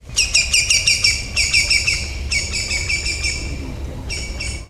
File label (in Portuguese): pica-pau-do-campo, aves